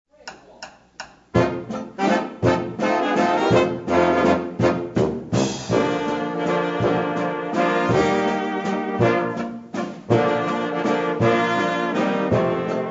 waltz

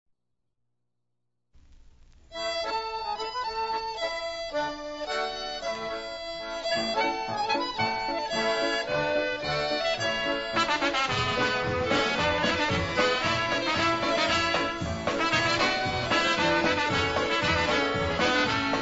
POLKA (INSTRUMENTAL)

